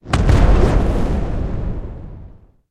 shell explosion; tanks; War Thunder

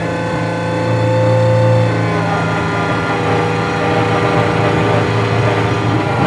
turret; World of Tanks

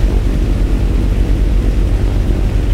b25 cockpit l, engines; War Thunder

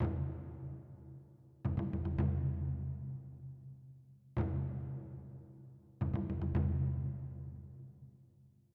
music interactive; World of Tanks

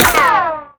ricochet mason; Modern Warfare

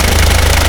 mg empty indoor

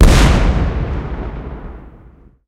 aa explosion; War Thunder

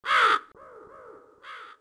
winter crow; World of Tanks Blitz; ambient medvedkovo

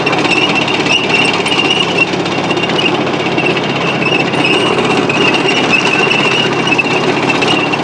Vehicle Engines; Red Orchestra Ostfront 41-45

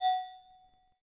Chat; Civ4 beyond sword

